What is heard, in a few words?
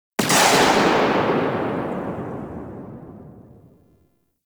Arch Railgun Charged 2D Tail; Warframe; Archwing